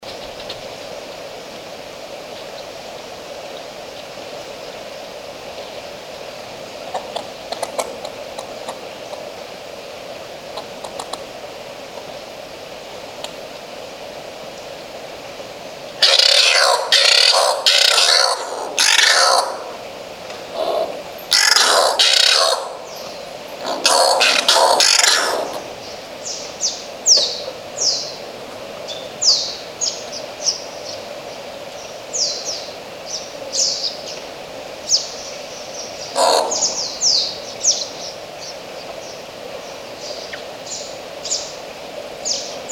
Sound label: Oilbird